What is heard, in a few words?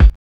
OVERTONER, KICKS; Zero-G; Funky Elements